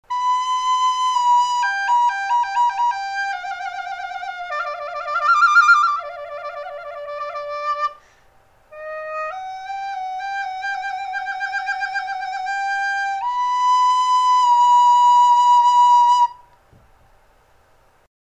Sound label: ritual flute